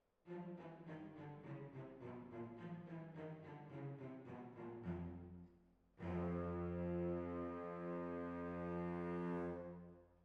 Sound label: Hall, Jazz for piano